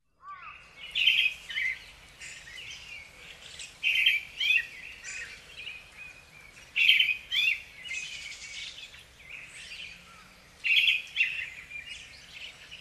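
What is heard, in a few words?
Eyebrowed Thrush; mamichajinai; hyogo; koe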